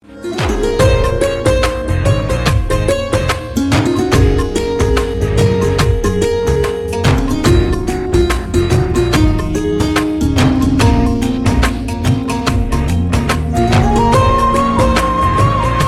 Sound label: Guitar Ringtone